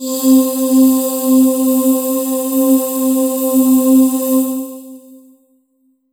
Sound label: Kurzweil K2000 Fair Breath C4